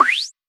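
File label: TR909 Snare, Roland SH-32 Drumset; Drumset 04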